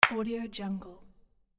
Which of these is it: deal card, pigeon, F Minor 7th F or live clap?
live clap